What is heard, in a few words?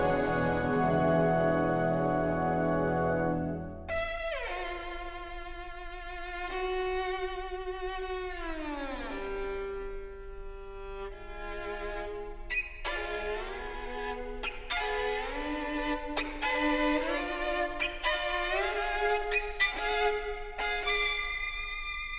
Symphony Orchestra; Grofe William T. Stromberg Bournemouth